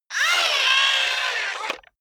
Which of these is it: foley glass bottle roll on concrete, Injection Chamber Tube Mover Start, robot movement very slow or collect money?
robot movement very slow